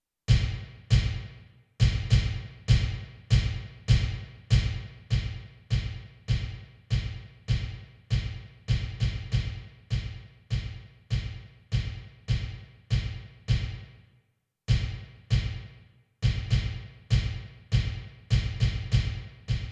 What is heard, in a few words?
bass drum only; the royal drummer